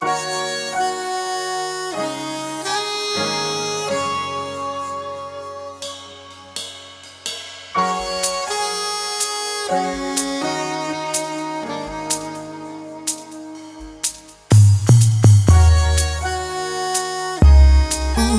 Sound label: Nu Jazz mix